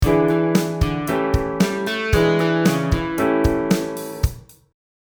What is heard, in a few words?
Straight chords